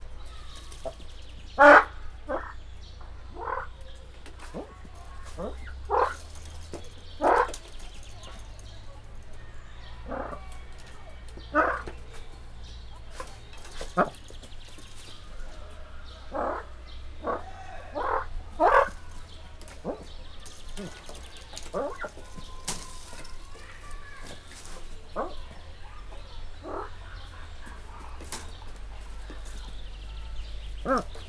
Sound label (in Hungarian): akadozva, feher fulesfacan; miskolc zoo